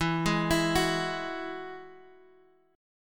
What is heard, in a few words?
E Major Add 9th, Guitar Half Step Down